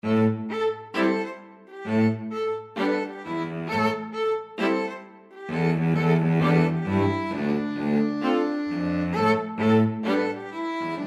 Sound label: hip hop house, string quartet